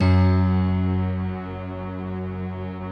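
bright electric piano strings, Slow Octave Piano Strings; Optical Media International - Sonic Images Library; Slopiastring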